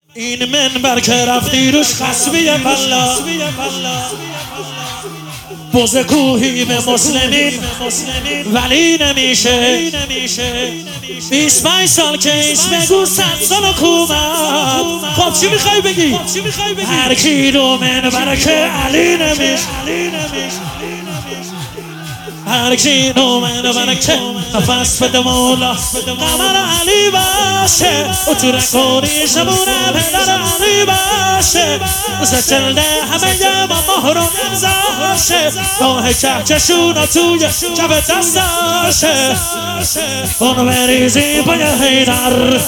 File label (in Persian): مداحی